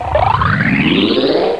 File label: konkie star, cartoon sfx